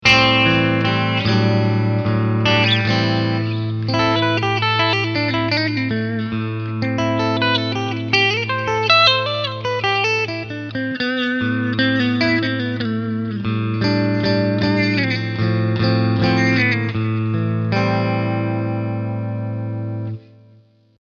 Clean Picking beide HB SC M

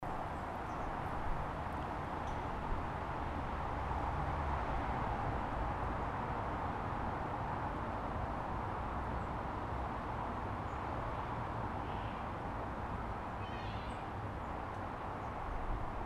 Außenatmo mit Windschutz; Centrance MixerFace R4B; PM1 XY HPF

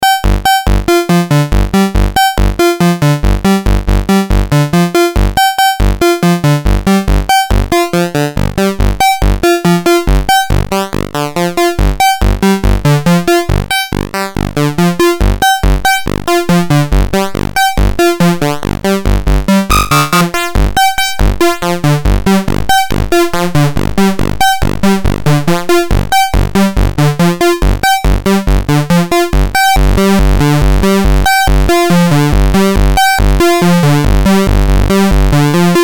A-111 Grundwellen

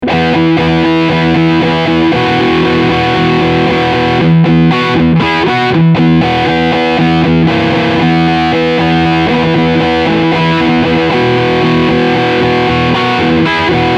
Steg PU Overdrive Riff

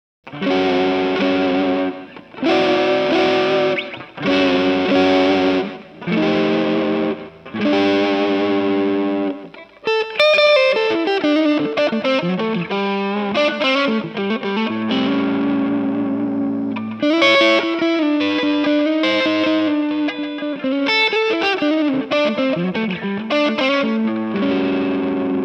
Gretsch Hals PoT

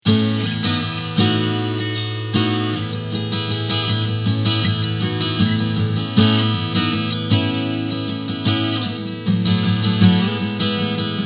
Strumming Schallloch

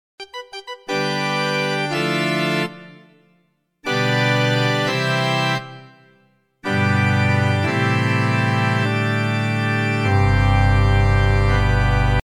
Orgel

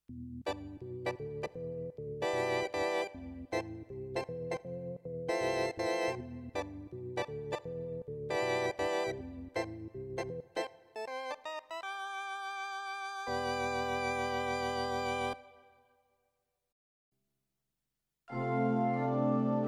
Orgel

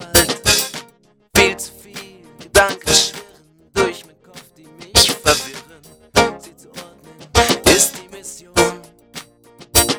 Bassd triggert Midsign

